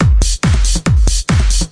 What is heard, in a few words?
140 huge kicker, drum loops and breaks